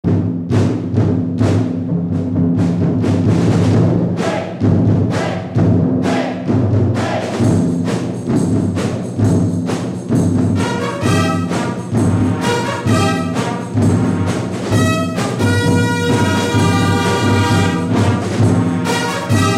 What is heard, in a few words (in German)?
Fanfaren Beat